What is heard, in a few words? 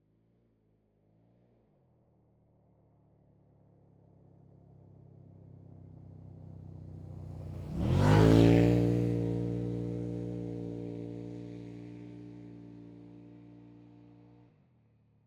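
Textron WildCat X - EXTERIOR - ACCELERATE - By; 2018; ORTF; MKH8040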